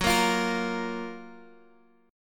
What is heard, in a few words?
Gb Major 7th, down, Guitar, Half Step Down